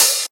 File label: Open HH, Cymbals